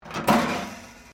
METAL BIN IMPACT WIRE FENCE; Metal (Sound Effects:Materials)

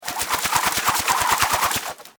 Warfare Grapple Gun Rope Spool Fast Device; Miscellaneous Combat

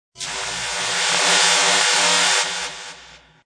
Electric Static; Noise Sound Effects

